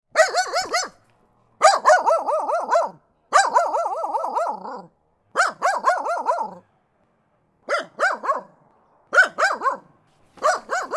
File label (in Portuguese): cachorro velho latindo